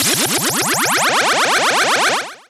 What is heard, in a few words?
warp on; robotron